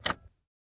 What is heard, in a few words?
Dodge Van Switch Pull; switches